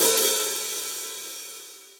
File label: Hihat Multirod Open Shank Dry Acoustic; Drum Hits; Standard Hits; Ableton; Factory Packs; Session Drums Studio